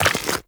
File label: Step Goo, Footsteps, Walk; Warframe; Characters; Tenno